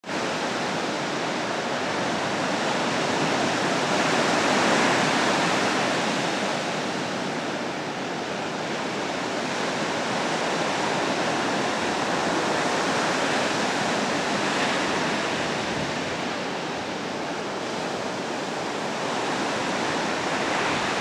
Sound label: Sea Waves; Sea Waves 1